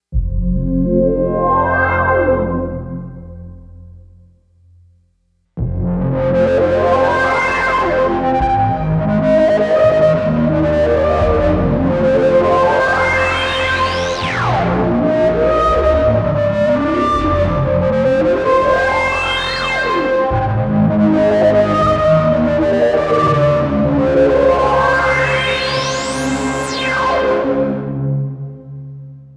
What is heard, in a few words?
synth